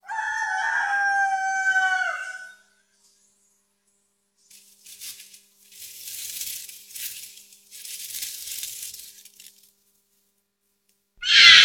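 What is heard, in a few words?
fox cries in night forest thicket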